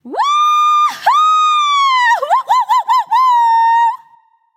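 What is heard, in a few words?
yay, female; smite; voicepack; hera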